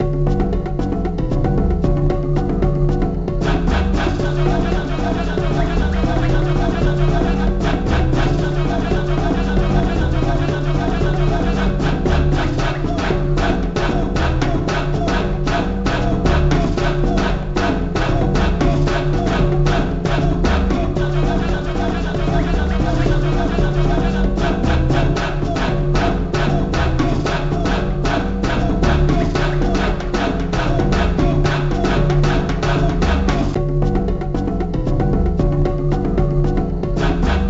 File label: FOREST Stage BGM; Joe Mac Returns; arcade gamerip 1994